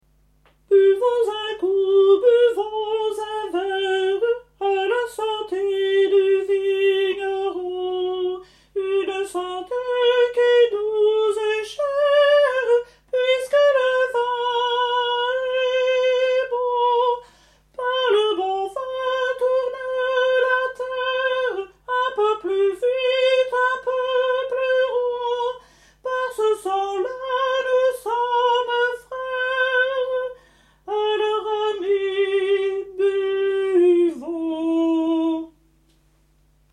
tenors; a la sante du vigneron

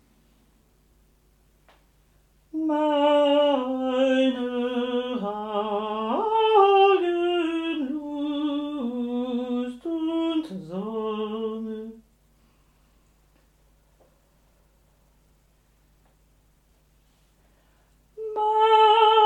basses; jesus bleibet meine freude

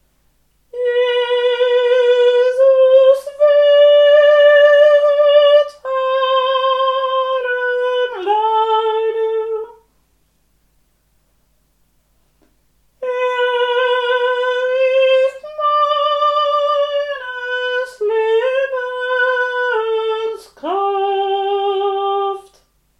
soprani; jesus bleibet meine freude

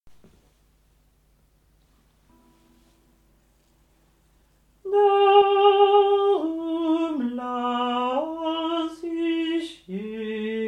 basses; jesus bleibet meine freude

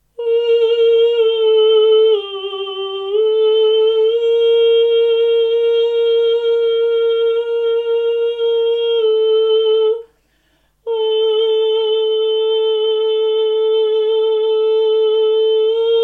tenors

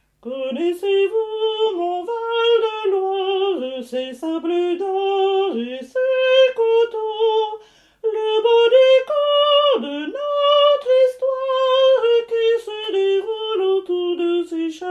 soprani

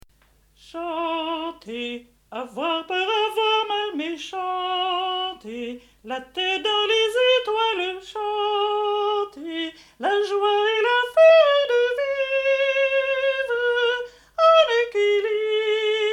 chanter alti

